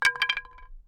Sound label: stick fall